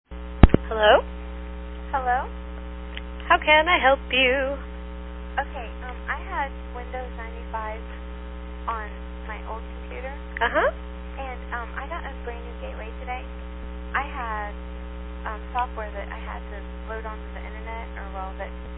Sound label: Tech Support Singing Support; PLA